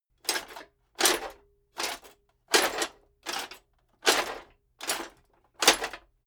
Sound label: metal plate armor movement sequence